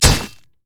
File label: basicattack; pantheon